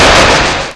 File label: gas release